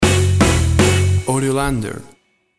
UK Ska Music Stinger

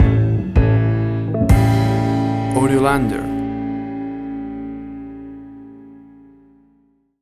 50s Rhythm and Blues Girl Group Stinger

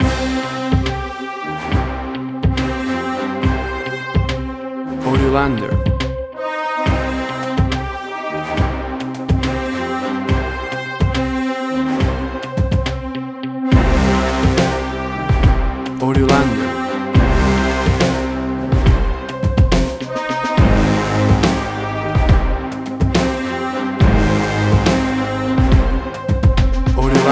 Drumcore

